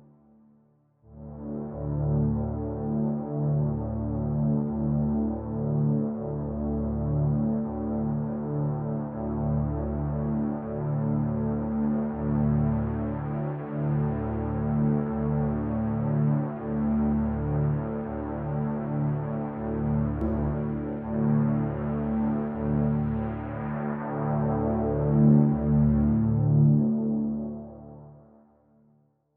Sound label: Epic Underbelly Pad